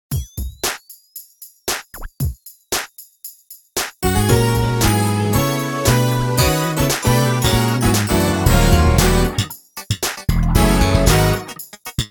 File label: Funk drumless